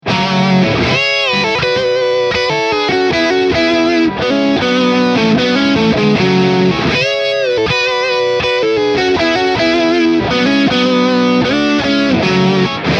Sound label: twin harmony